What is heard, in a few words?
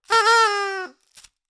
yay